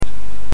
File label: Rain with clock